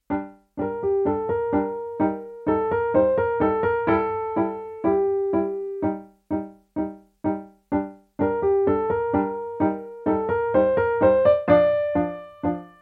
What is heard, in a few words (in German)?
Spionage-Tango, Endtempo